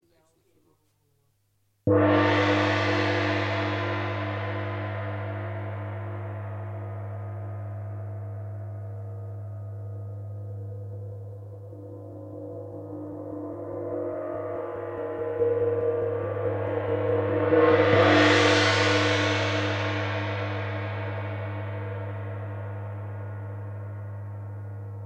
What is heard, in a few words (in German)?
tamtam